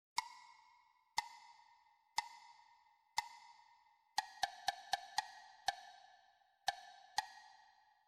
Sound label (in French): rythme